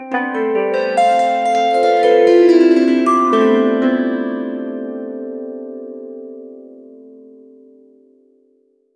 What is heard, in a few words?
whimsical harp notes